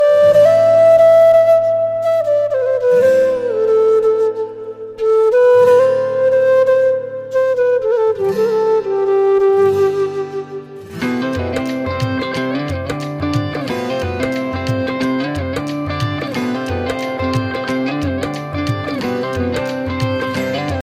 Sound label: instrumental ringtone